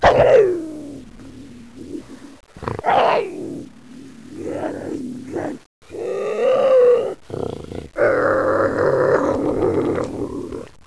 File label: bobcat